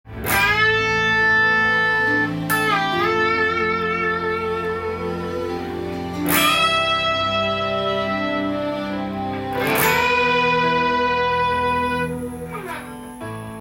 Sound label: solo; how to play ballads in a cool way